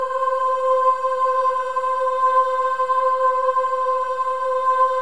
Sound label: BOYS AHS, Symphony of Voices